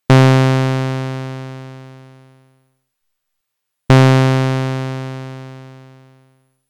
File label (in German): Sägezahn; Erica Synths DB-01